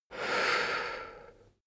male breath medium out

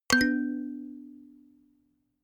notification kalimba third up

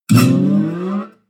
bottleneck strings slide up deep

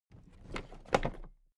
toolbox raise regular

